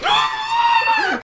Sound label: Girly scream